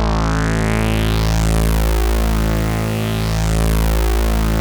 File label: OB A2 -L, Rare Synthesizer; Hollywood Edge - Giorgio Moroder Rare Synthesizer Collection; OB 4 VOX.